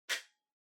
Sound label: Portable MD Far Open